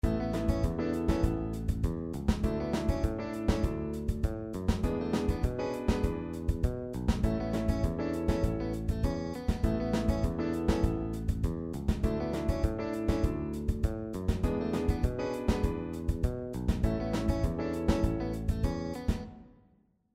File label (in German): Bandpattern Samba; Popularmusik